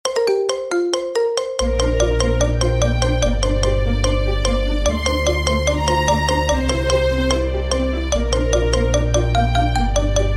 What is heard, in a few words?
marimba remix